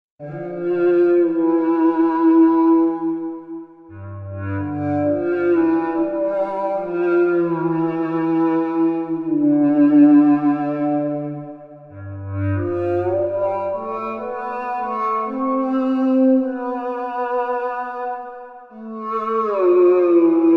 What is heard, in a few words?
Cypher2 ST Deep Cello 5D; FXpansion